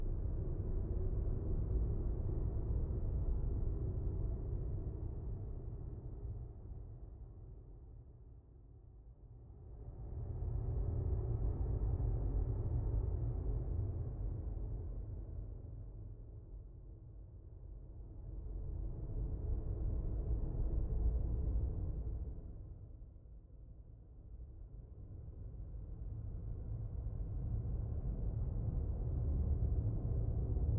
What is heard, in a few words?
Ambience Breath